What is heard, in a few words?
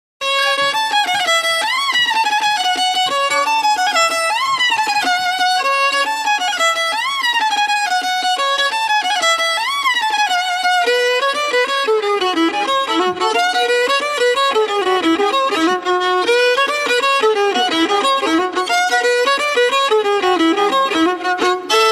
vesnianka mel skrzypc